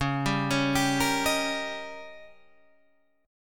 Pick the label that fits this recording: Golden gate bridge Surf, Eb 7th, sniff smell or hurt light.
Eb 7th